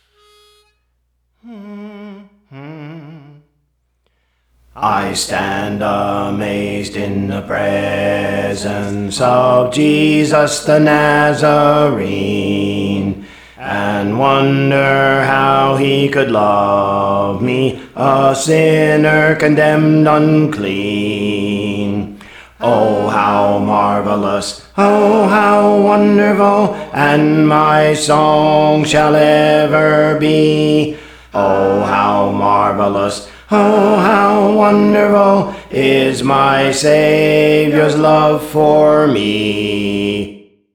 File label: Bass; Praise For The Lord Training